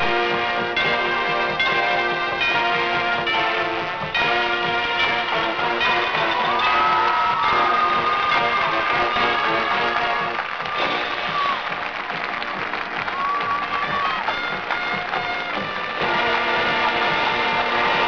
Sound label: Battle Hymn